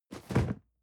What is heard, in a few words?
cardboard box medium fall low rustle; objekte